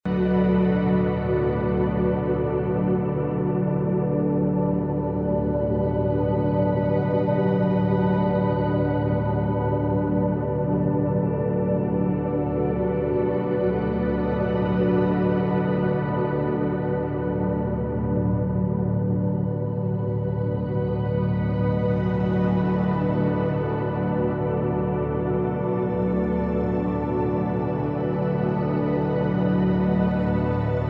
Amb Sound Scape Shimmering pads, Sweeps; Outer Space - Floating; Ambient SoundScapes

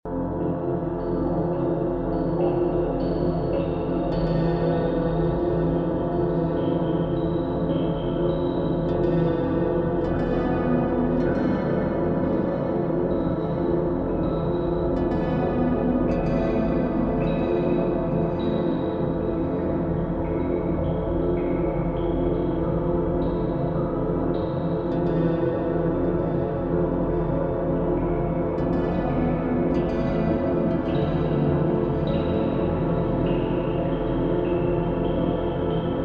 Amb Sound Scape Eerie Guitar, Water drips; Ambient SoundScapes

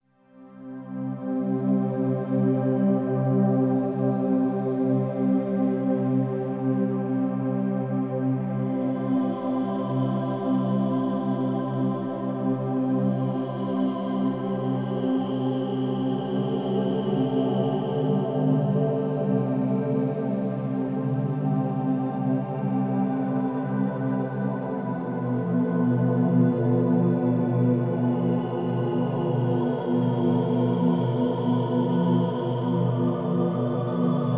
Amb Sound Scape Soprano voice, Deep ambience; Musical; Ambient SoundScapes

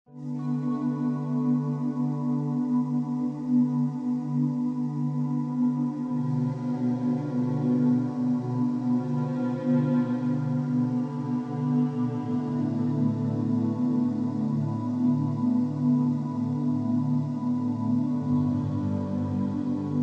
Amb Sound Scape Slow viola, airy chords; Musical; Ambient SoundScapes

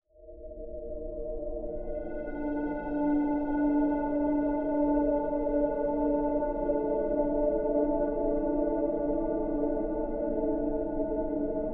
Amb Sound Scape Percussion effects, Light Wind; Ethnic; Ambient SoundScapes

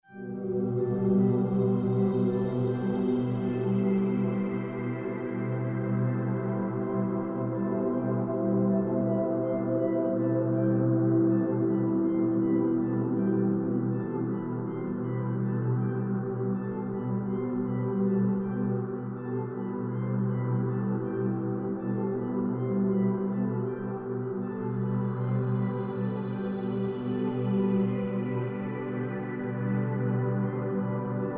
Carnival theme, Light wind, drones; Musical; Ambient SoundScapes

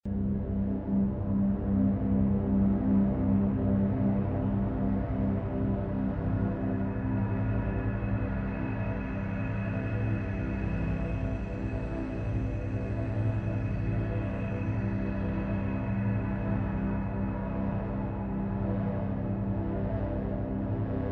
Smooth, Pulsating synth, Ambient SoundScapes; Warm Ambient

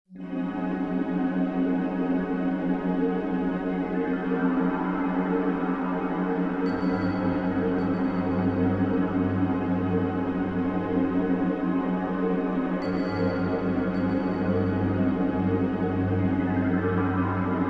Amb Sound Scape Wind, Bells, Echos; Ambient SoundScapes

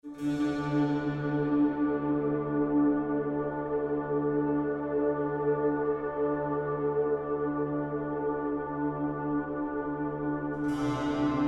Amb Sound Scape Harpsichord, Woodwinds, Ambience; Ethnic; Ambient SoundScapes

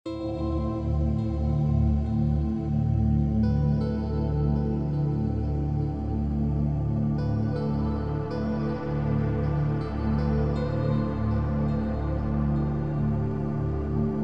Echoing plucks, Deep ambience; Outer Space; Ambient SoundScapes

